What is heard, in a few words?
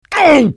pain; kunkka